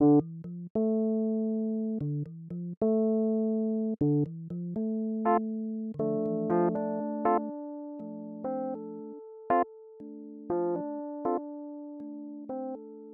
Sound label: riffy Rhodes